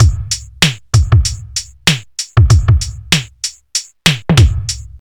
96 bpm breakbeat e key, Free breakbeat - kick tuned to the E note. Loudest frequency: 1698Hz